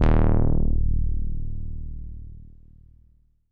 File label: Wonky d#1, Korg Poly-800